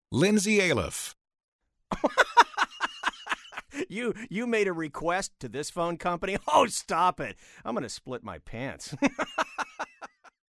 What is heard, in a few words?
laughing